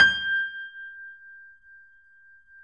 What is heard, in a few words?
G 5, Keyboards Acoustic, GRANDP ST; USB Soundscan vol.17